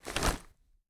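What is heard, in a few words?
gear rattle weapon large; viper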